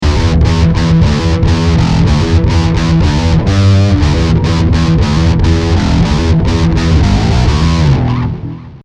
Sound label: Boss GT-10B Linearer Amp